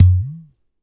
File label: UDU DRUM